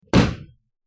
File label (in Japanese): 重い扉を閉める音